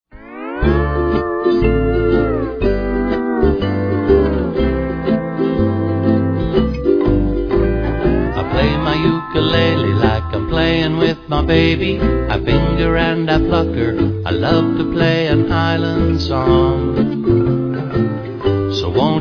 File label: Ukulele Baby